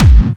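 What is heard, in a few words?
BD Clubby, bass drum; VEC1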